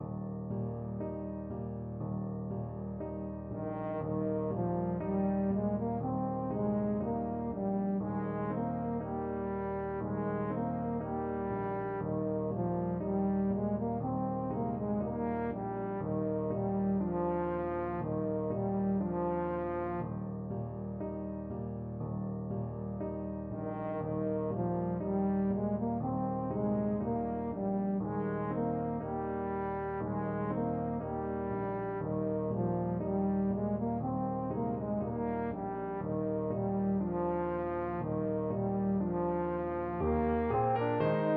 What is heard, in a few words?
irish lullaby trombone